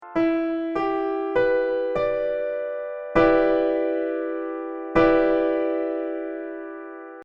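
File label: Guitar Em7 piano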